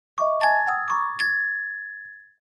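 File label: Clavichord Short Funny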